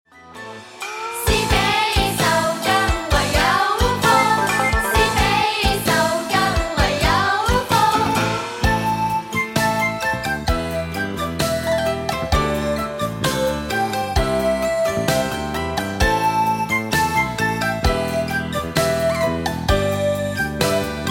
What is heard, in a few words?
Karaoke